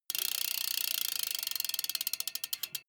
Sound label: bmx bike freewheel push and slow down short